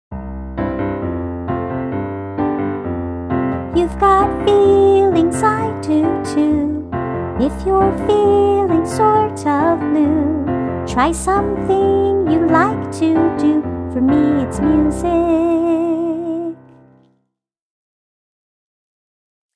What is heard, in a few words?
vocals